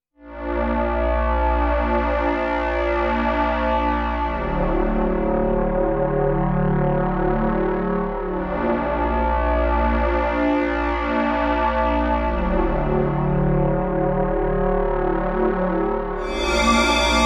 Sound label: Frosted Pad, KORG Node